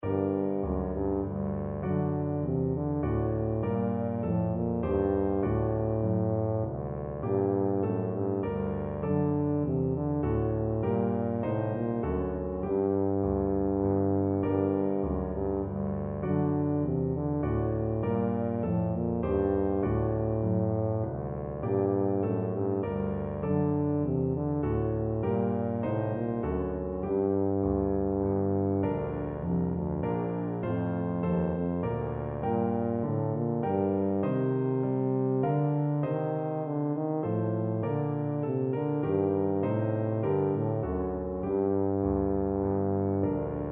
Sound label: purcell air, tuba